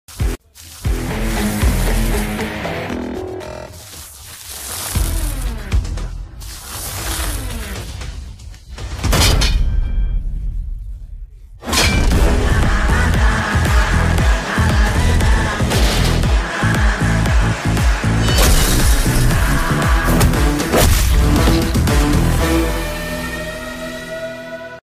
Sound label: attitude bgm; Family Star movie teaser